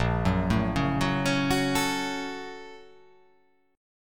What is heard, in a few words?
A sharp Minor Major 7th Sharp 5th